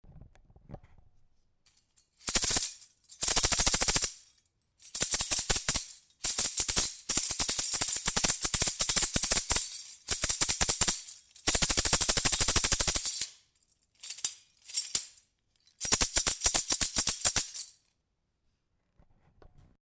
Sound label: Rattle